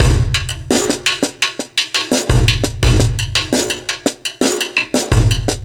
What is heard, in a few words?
Bon Bon 4-Bar, Breakbeats, Drum n Bass; KJ Sawka; Drum n Bass & Beyond